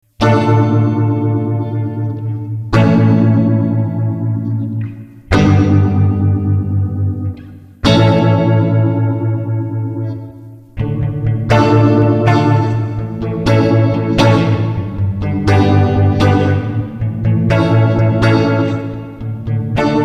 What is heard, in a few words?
Phaser; instrument + OCTO-FX + audio interface; phaser + reverb; reverb level; phaser rate